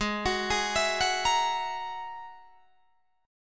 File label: Ab 7th Suspended 2nd Sharp 5th, Guitar, Drop D